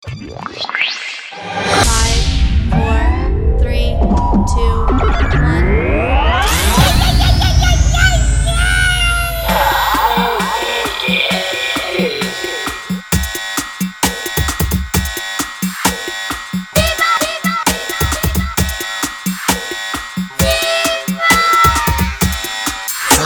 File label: R&B Hip Hop Intro